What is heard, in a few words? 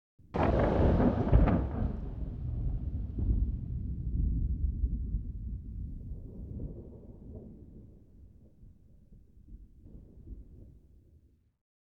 Thunder Clap; Single Clap, ambience